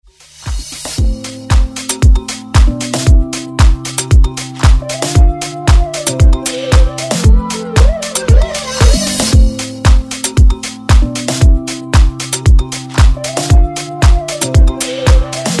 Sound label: Top40 Redrum